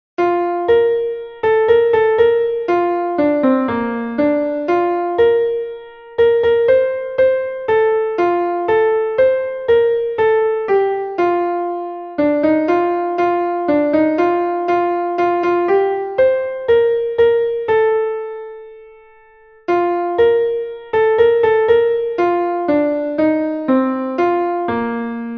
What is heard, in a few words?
bowl